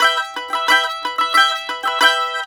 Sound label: 18O, Mediteranean Traditions; USB Soundscan vol.53; AKAI